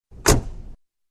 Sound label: Car Door Close